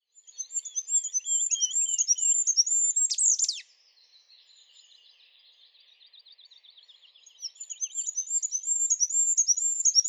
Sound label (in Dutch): Boomkruiper